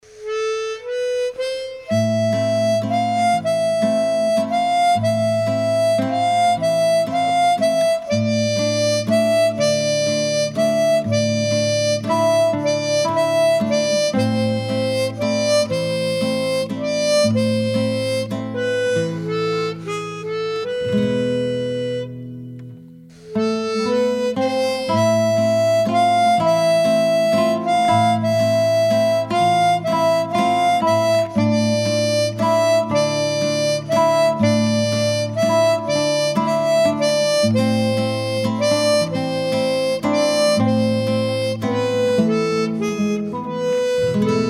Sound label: Instrumental